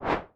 weapon melee; adarkroom

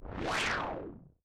upgrade engine; adarkroom

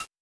buy; adarkroom

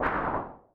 weapon ranged; adarkroom

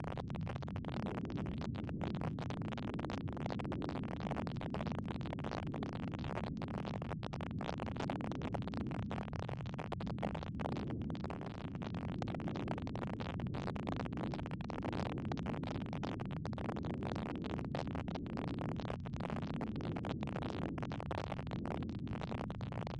fire burning; adarkroom

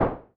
weapon unarmed; adarkroom

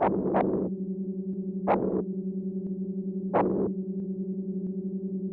event beast attack; adarkroom

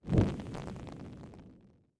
light fire; adarkroom

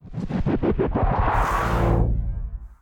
Light Gun; Bear; bossAttack

